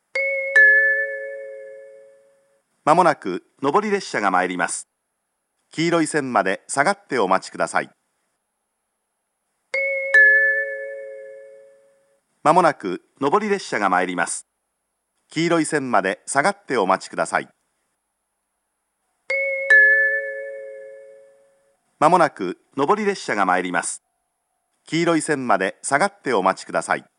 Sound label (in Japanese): uguisu